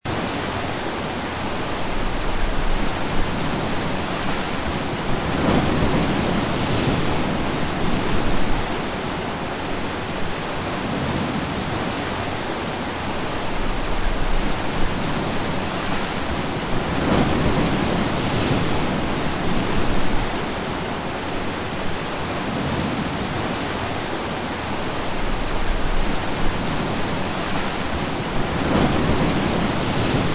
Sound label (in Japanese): 強風と荒波